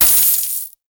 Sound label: Fist Claw Coin Impact; Incarnon